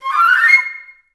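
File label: flute run up C5; Best Service; Peter Siedlaczek's Advanced Orchestra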